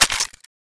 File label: sg552 mag out